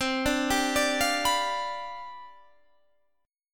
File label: C Major 7th Suspended 2nd Suspended 4th C down, chord